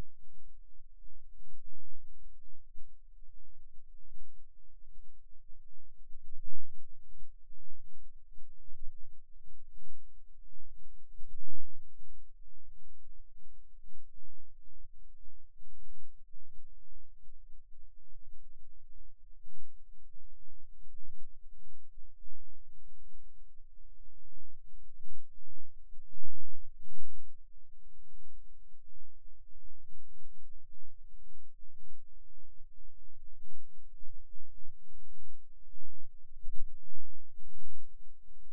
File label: Rhythm Wave, eeg seizures